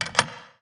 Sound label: unlock